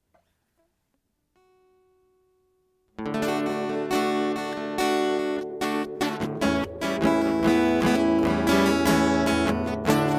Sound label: Stance Guitar; hyper terrestrial